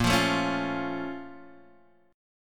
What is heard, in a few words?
A sharp Minor Major 7th; strummed; x,1,3,2,2,1; JGuitar